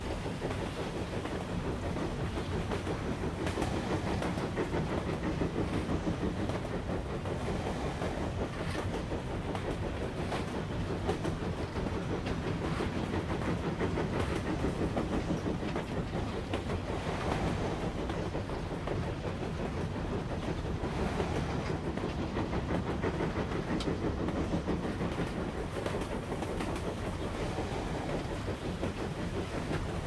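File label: train north third class interior; The 39 Steps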